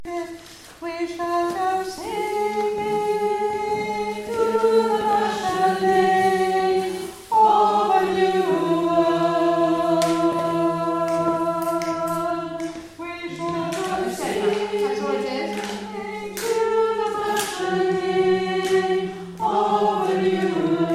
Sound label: we shall go singing tune